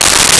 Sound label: Skate; Nintendo; July 2020 Gigaleak